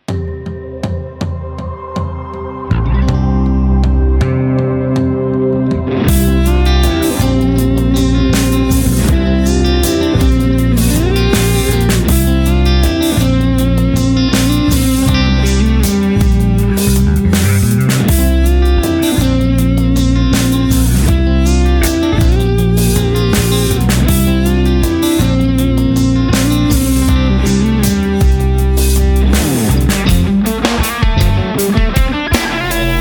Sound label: Binary IR Cab; HOTONE